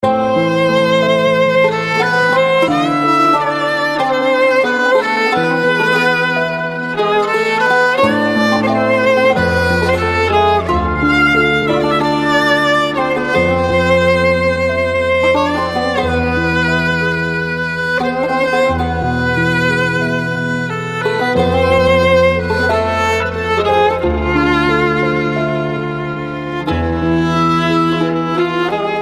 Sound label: violin turko; classical